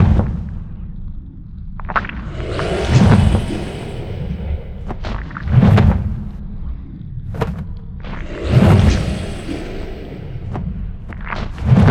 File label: voidspawn moving; Stellaris; distant stars